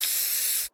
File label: spray; codem-mechanic